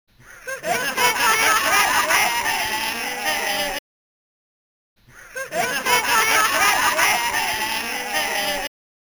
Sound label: Home Cheering